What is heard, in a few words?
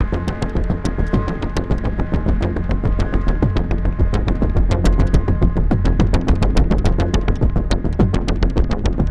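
music, synth build loop; transformers war for cybertron gamerip; IACON IN RUINS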